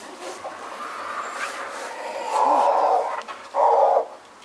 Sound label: Scary Cock, Birds